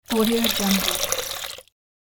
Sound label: water splash